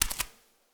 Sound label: Footsteps Barefoot One